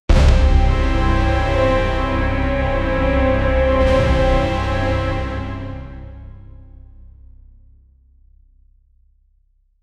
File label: bold percussion driving, stomps